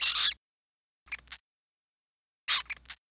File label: object rat; lucius